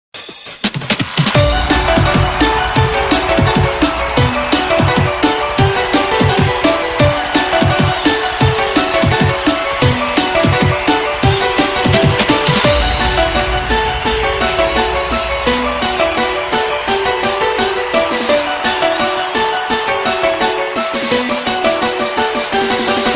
Funny Music Ringtone

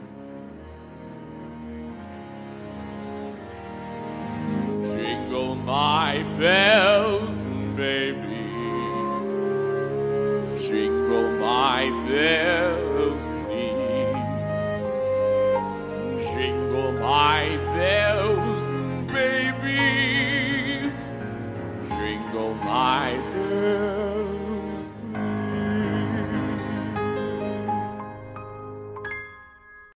jingle my bells

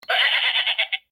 Goat

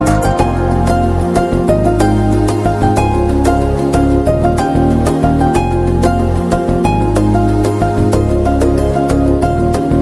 Eppudaina piano

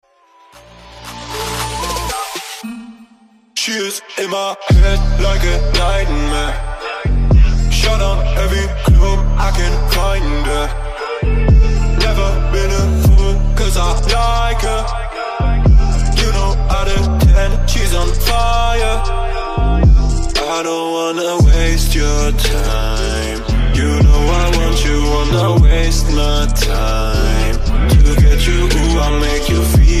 Scratch

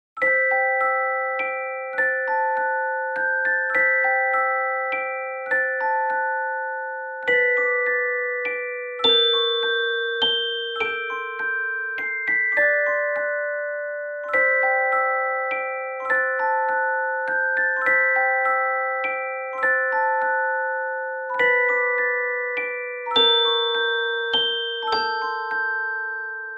Music Box; Zeldas

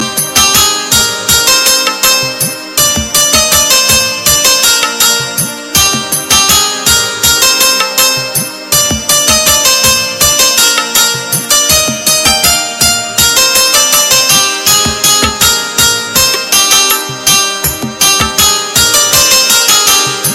New Tone

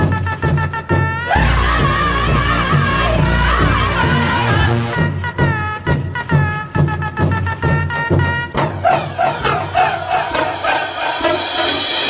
Native Tribal

